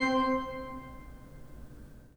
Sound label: PIPE, 3DOrgans